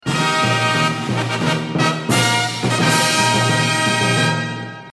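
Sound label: fanfare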